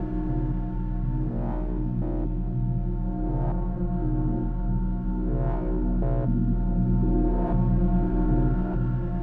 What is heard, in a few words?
sounds music; Halo Combat Evolved; Xbox